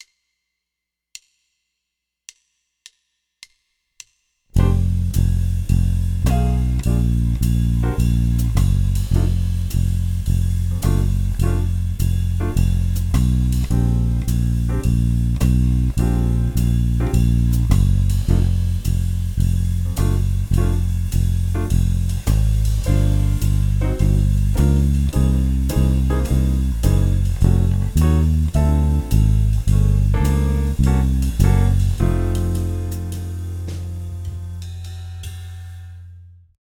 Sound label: Walking Bass; Improvisation For Beginners